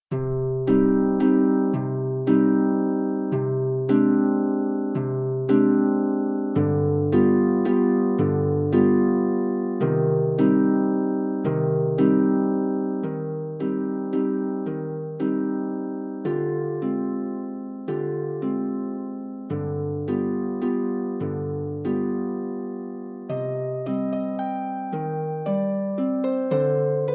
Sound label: Harp; Harp Music from Argentina; Triste no 5